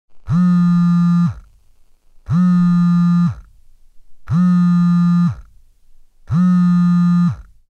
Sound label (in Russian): Частая вибрация телефона